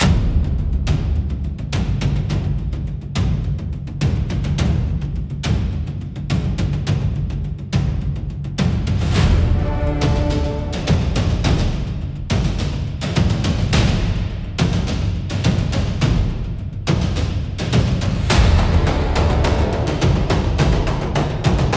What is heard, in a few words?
Thunder over Edo, cinematic Japanese style battles